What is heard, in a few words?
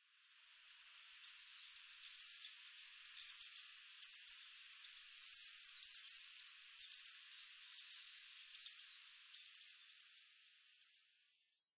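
Meadow Bunting; hoojiro; kyohto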